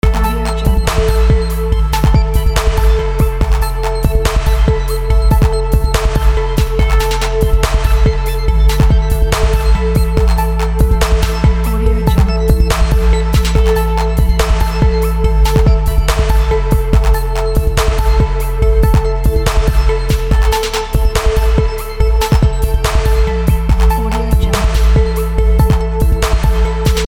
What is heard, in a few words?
hip hop loop